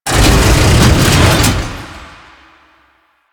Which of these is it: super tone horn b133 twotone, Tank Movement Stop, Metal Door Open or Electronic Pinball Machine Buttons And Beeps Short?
Tank Movement Stop